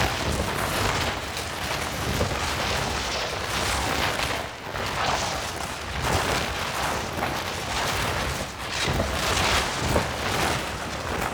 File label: Vendor Buff Menu Hover Right Loop; Tau; Circles Of Hell